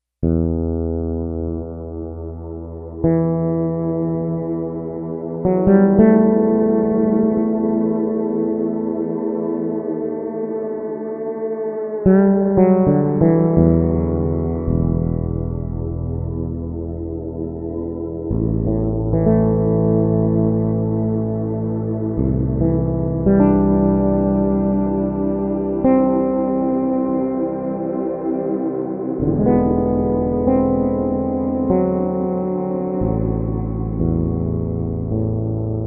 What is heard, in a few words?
DREAM BASS; Digital Synthesizer